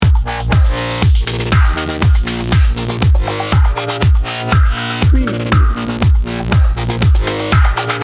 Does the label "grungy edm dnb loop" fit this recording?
Yes